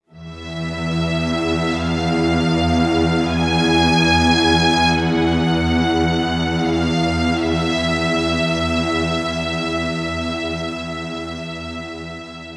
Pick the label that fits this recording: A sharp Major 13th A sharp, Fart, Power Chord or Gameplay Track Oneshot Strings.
Gameplay Track Oneshot Strings